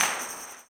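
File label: tambourine, 160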